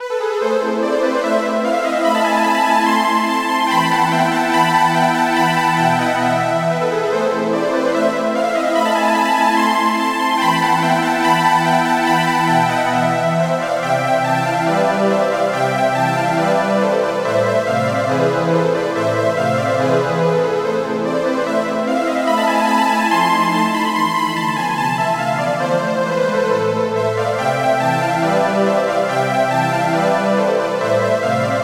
halo pad

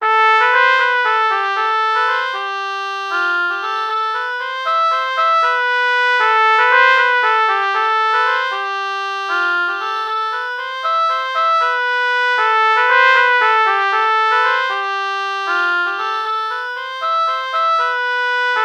oboe trumpet melody morph

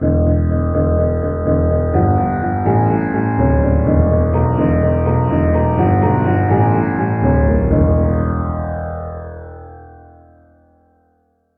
sitar bass

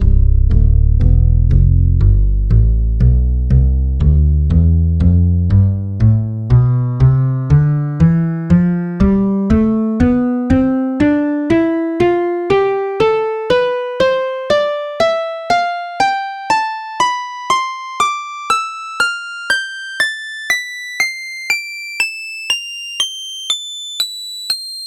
acoustic bass tonleiter

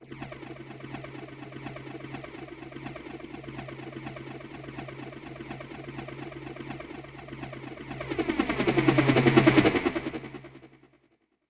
helicopter bass

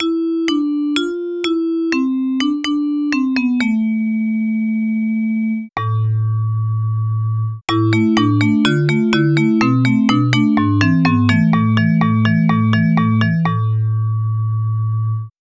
vibraphone

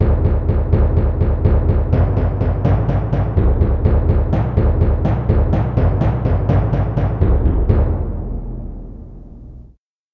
timpani bass

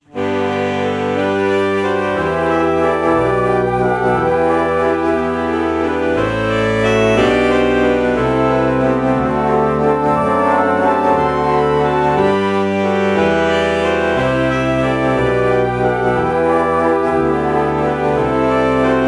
alto flute alto saxophone morph

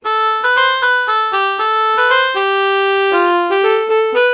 alto saxophone oboe melody morph

